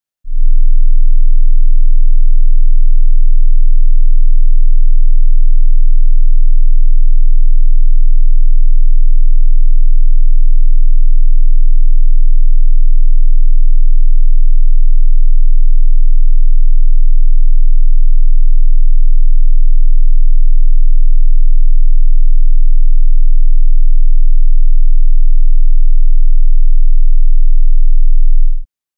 20hz - 0 dB - Both - Frequency Response; The Sheffield - Coustic - Set-up and Test Disc

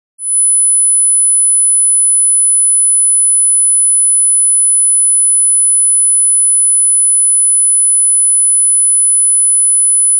10 KHz - 0 dB - Left; Channel Separation; The Sheffield - Coustic - Set-up and Test Disc

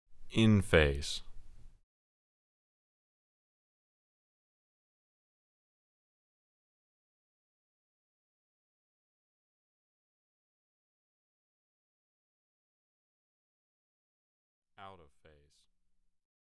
Channel Phasing, test tones; The Sheffield - Coustic - Set-up and Test Disc

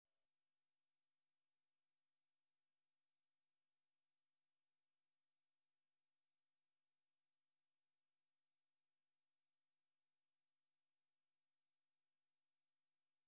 1 KHz - Minus 20 dB - L&R - Tone; The Sheffield - Coustic - Set-up and Test Disc

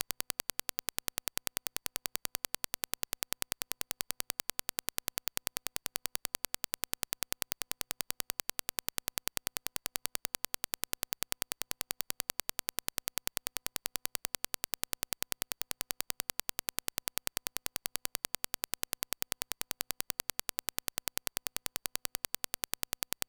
Dirac Delay, test tones; Masconi

